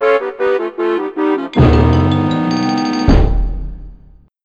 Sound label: Life Lost Jingle; Gex 3: Deep Cover Gecko; PlayStation